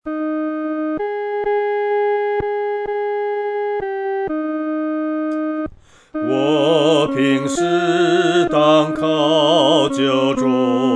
独唱 第二声; 信靠耶稣

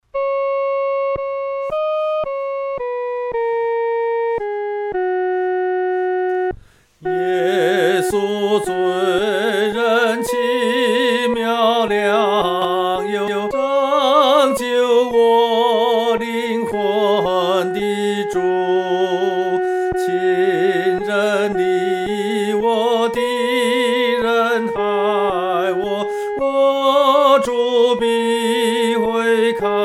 独唱 第一声; 伟大的救主

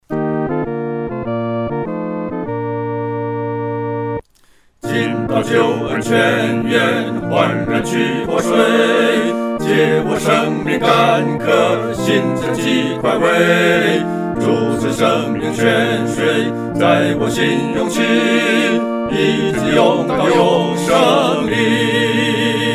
合唱 四声部

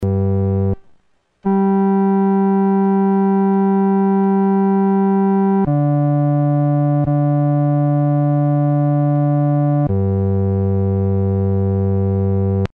独奏 第四声; 阿门颂（一）

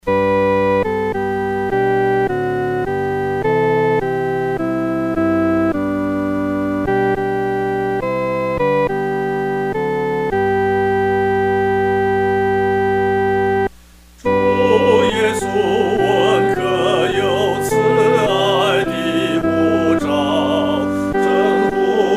慈声呼召 独唱（第一声）

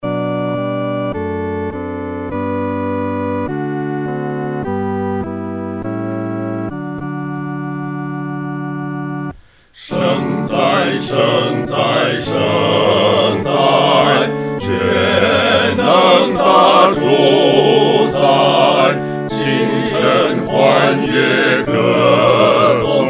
合唱（四声部）; 圣哉！圣哉！圣哉！

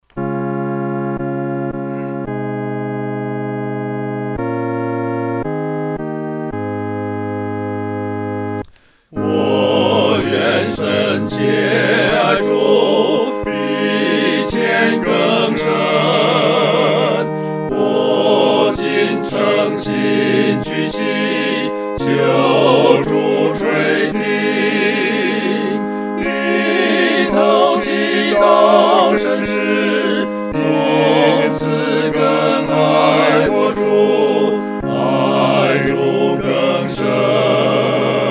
合唱 四声部

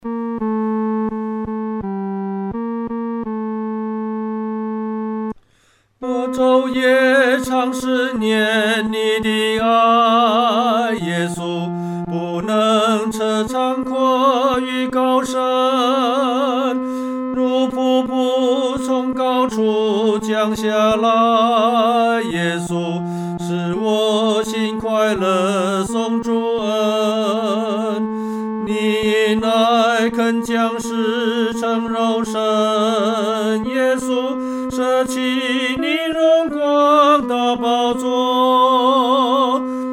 独唱 第三声

